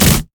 decoy swipe; LoL; monkeyking

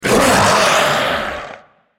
Dragon spell cast effort; Shyvana

